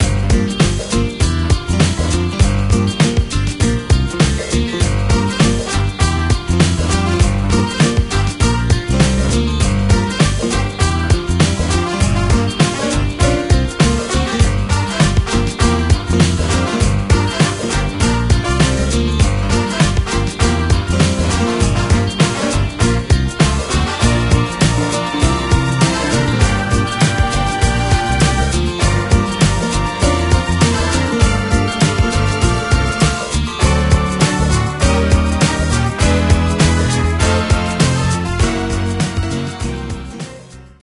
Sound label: Uptempo Music